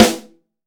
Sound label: BRASS, BRASS SNARE